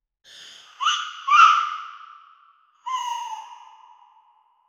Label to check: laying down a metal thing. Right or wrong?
Wrong